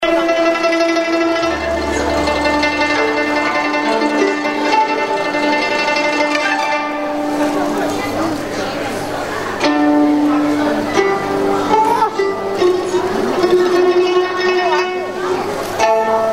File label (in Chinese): 古筝